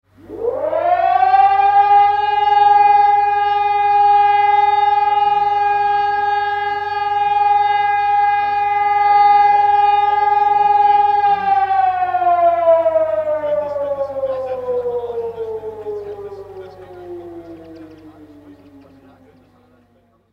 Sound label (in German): Sirene; Pulsnitz